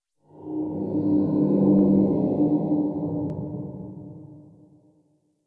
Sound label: Exterior Distant Calls Angry; Ambience; Duviri; Warframe